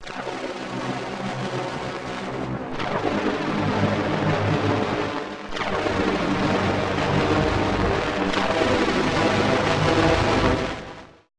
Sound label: ALIEN TENSE; eShop